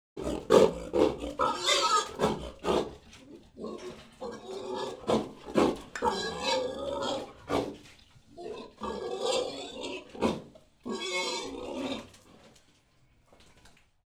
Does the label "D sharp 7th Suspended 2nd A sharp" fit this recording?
No